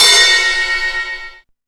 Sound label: CYMBALS, Disco House; USB Soundscan vol.20 - Fresh Disco House I